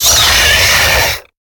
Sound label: Stealth Attack; Lurker; Evolve; Gorgon